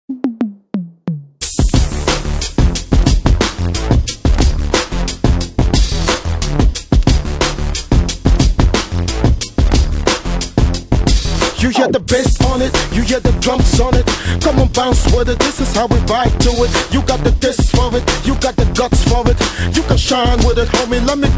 hiphop; Sound PooL

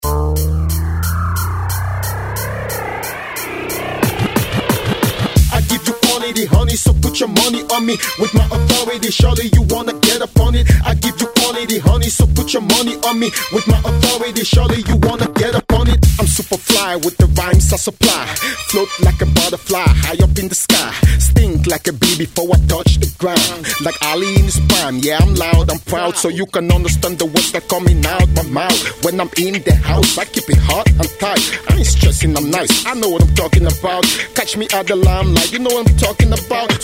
hiphop; Sound PooL

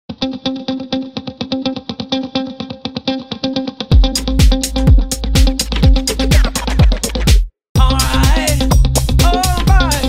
disco house; Sound PooL

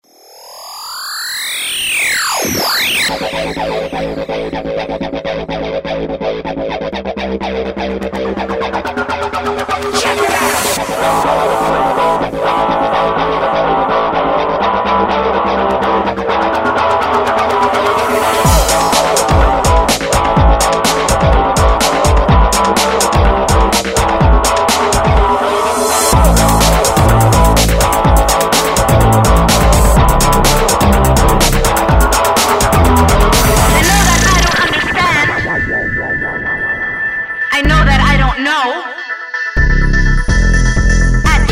bigbeat; Sound PooL

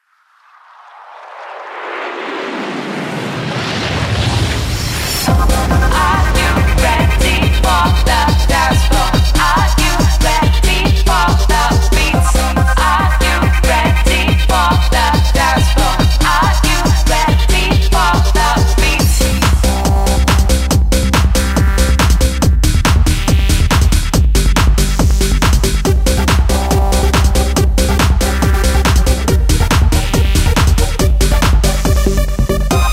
techno trance; Sound PooL

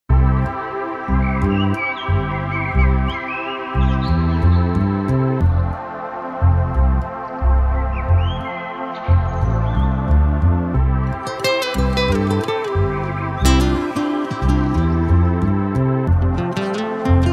chillout; Sound PooL

